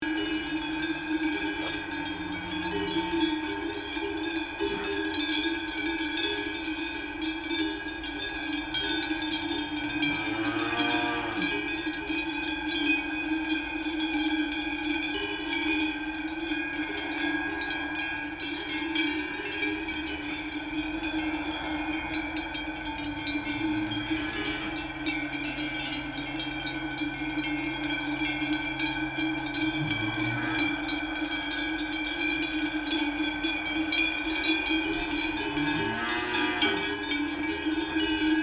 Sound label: Farm cattle bells ambience loop